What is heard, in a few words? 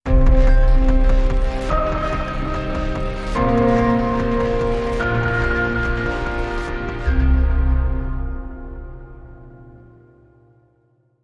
Sting